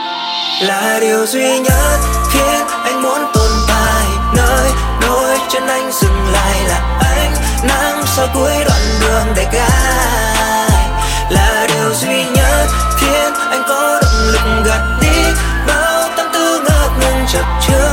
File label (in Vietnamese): Nhac Chuong, Bass Bounces; nhac tre